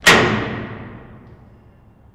Jail Door Lever Sing; jail & prison doors

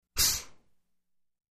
Small Air Brake

